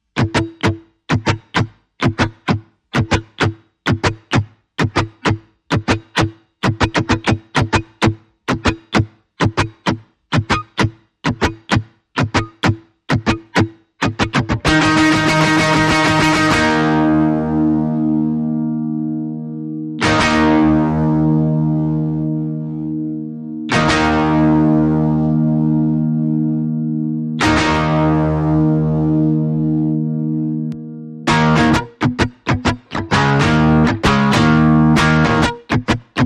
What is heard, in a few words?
Guitars